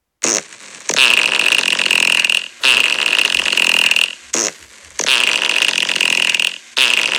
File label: fart; lego